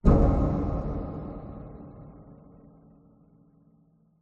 impact sound design wood heavy thump clunk reverb resonant; Skyclad Sound